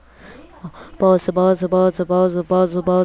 buzz

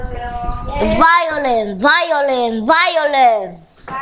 violin

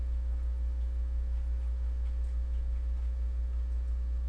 warning

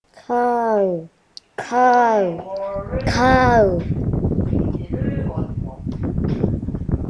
cow

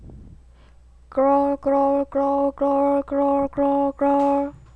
growl

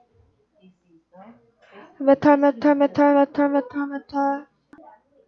metal

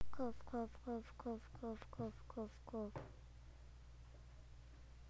cough

